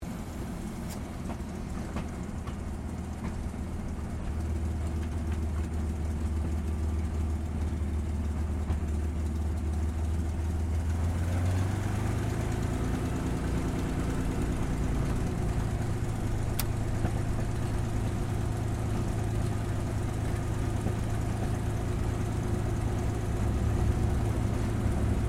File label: AIRCRAFT CAC CA-18 MUSTANG MK23 TAXI INTERIOR; CA-18 /P-51 Mustang; Airplanes (Sound Effects:Vehicles)